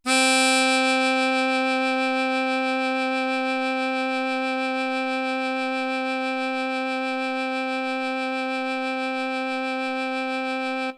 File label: harmonika; matsuyama wave 2014